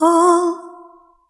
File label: "lady oh", Voice